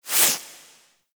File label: Undead Owl Close Flap; Dante; Warframe